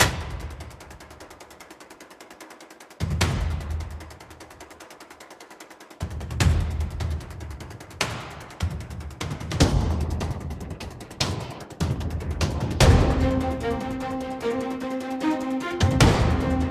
Thunder Bridge Run, cinematic Japanese style battles